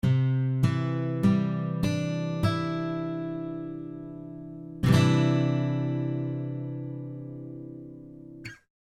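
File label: Guitar Cadd9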